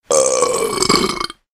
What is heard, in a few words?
Very Funny Burp